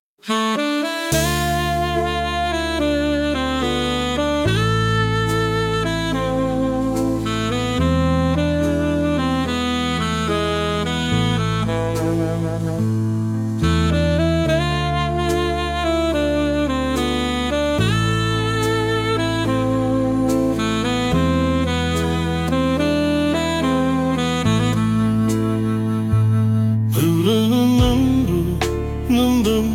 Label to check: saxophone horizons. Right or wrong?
Right